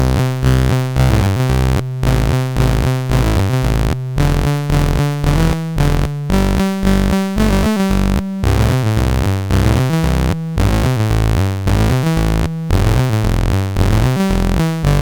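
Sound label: Operation Anoria, MOS 8580 SID chip music, games; CSG8580R5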